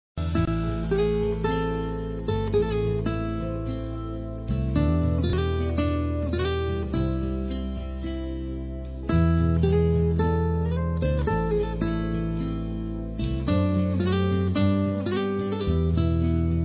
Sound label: guitar love bgm; sarocharu; ravi teja; parasuram